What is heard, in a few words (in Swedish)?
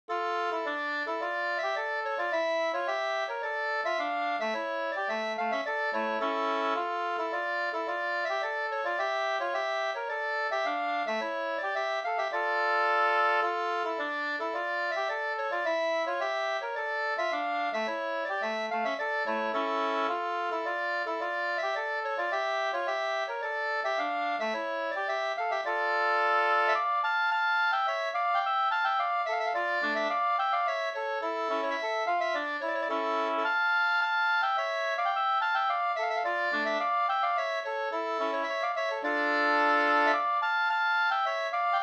polska Senpolska